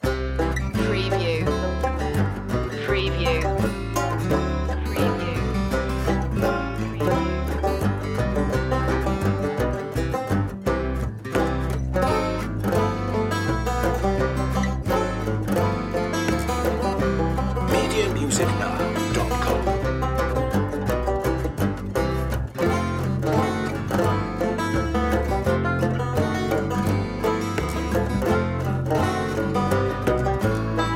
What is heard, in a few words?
banjo rag loop